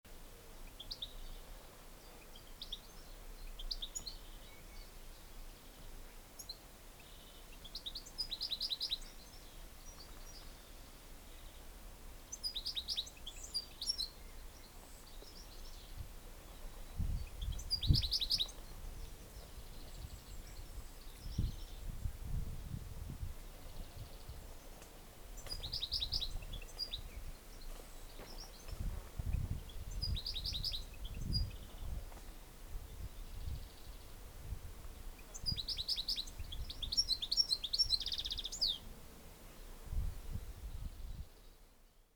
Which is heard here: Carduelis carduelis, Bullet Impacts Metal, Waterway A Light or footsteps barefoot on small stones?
Carduelis carduelis